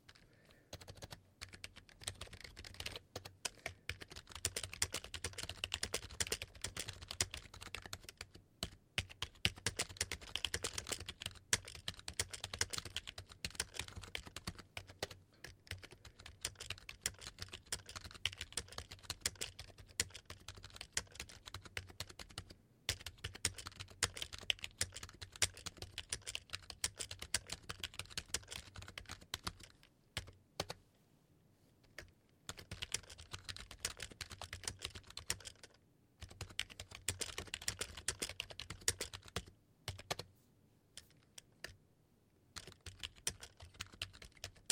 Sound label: Dell SK8115 Rubber Domed